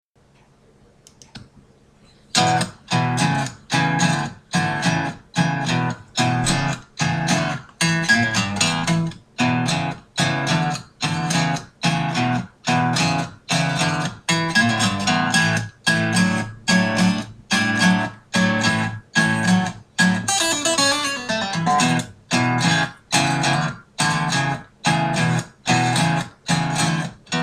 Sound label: using blues licks